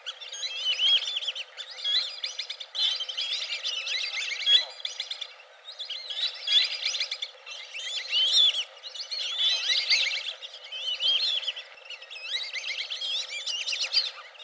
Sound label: Dendrocygna autumnalis